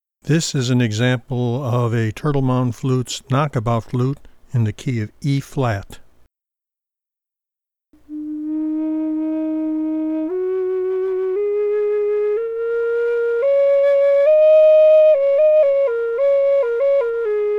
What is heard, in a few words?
Knock About Eb, flutes